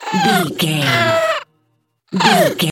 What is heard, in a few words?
goblin fairy effort push